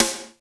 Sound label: Attack, Roland JD-990; Drum Samples